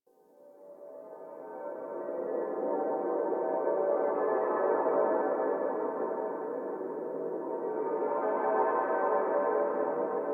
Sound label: Church Bell; Dagath; Warframe; Ambience; Clan Dojo; Dojo Misc